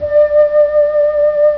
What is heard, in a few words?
flutey